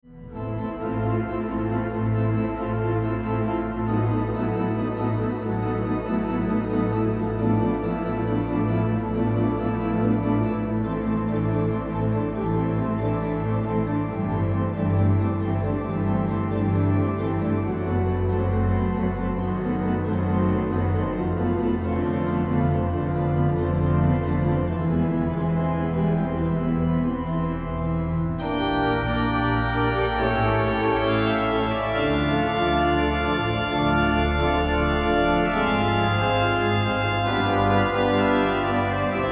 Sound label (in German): Orgelimprovisationen